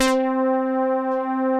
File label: ANA KEYS; Pro-Rec - Synthsonic; JV ANALOG1